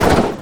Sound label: attack; dota; lone druid